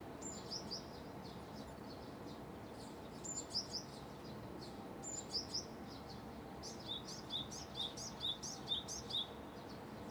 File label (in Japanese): 鳥の声とチャイム